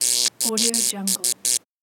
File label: electricity sparks